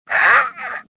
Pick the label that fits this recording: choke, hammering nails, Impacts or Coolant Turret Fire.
choke